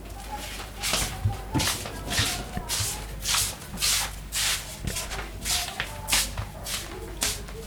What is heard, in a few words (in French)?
pas